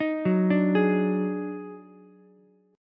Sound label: D sharp Minor Major 11th D sharp, Ukulele; arpeggiated